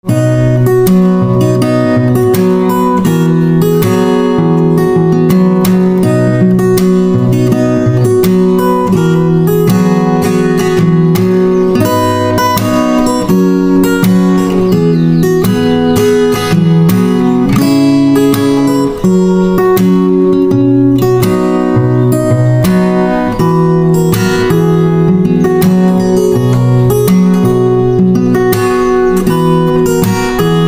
Guitar

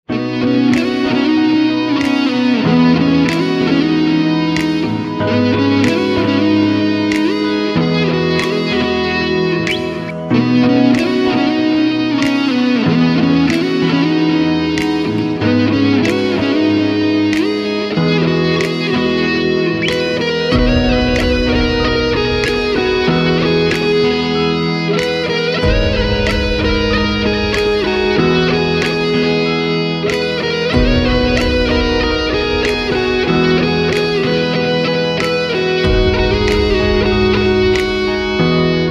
Guitar Electric

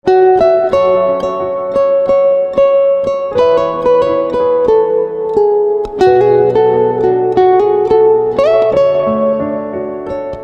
Guitar

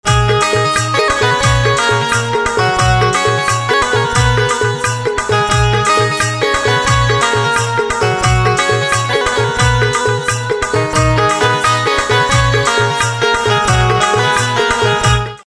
Banjo Instrumental Ringtone

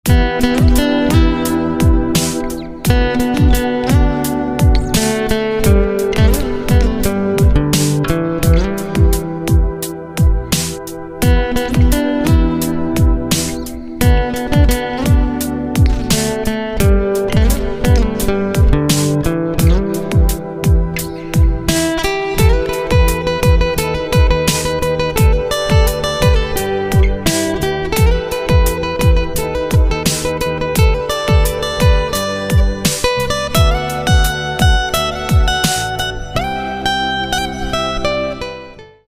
Guitar

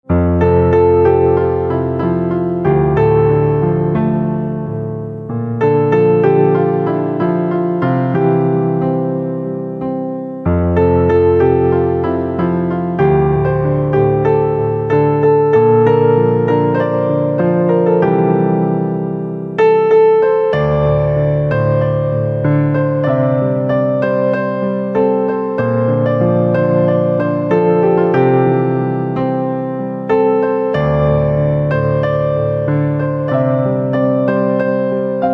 Piano

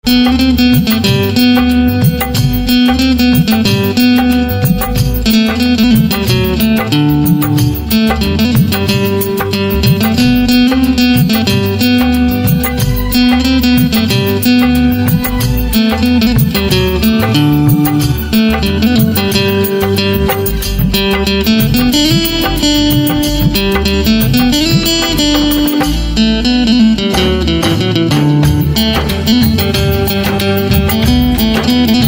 Guitar, instrumental ringtone

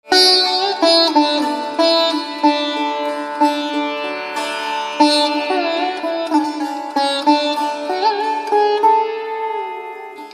Veena

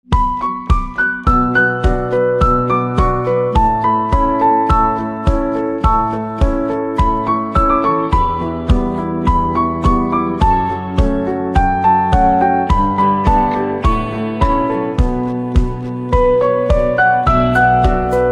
Xylophone

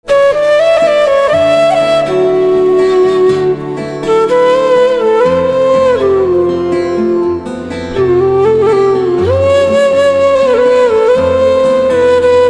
Flute; kalank 2019

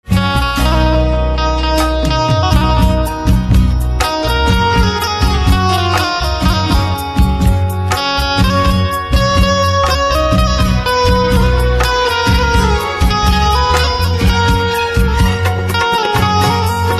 Banjo

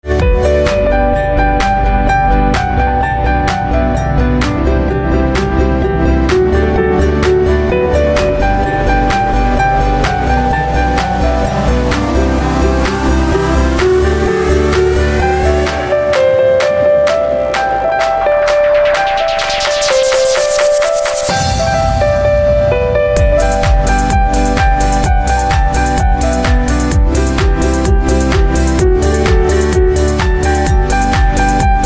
Piano Remix

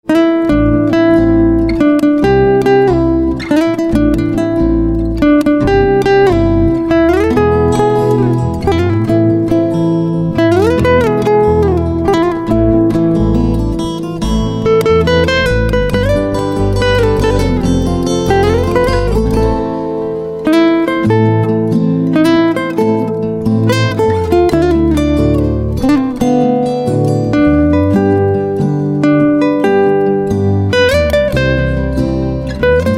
Guitar, instrumental ringtones

